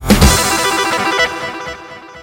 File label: secret lever chime